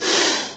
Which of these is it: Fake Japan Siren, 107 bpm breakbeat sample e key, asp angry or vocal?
asp angry